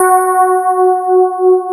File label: SPARKLES; Wizoo - Powered DX